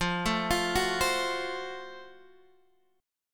Gb Minor Major 7th Flat 5th, chord

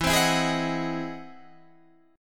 E Augmented Major 9th, Guitar, Open D

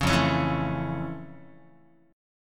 F Augmented Major 7th F Guitar; F+M7; strummed

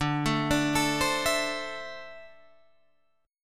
D 7th Suspended 2nd, Guitar, Drop D

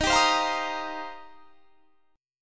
D sharp 6th Add 9th D sharp; D#6add9 strummed

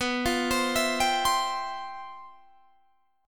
G 13th B, chord

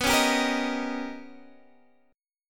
D sharp 7th B; D#7/B; strummed; chord shape

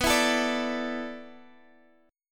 A Suspended 4th B, Asus/B chord; strummed

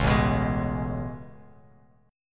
A Major 9th A, Bass Standard; AM9; strummed; JGuitar

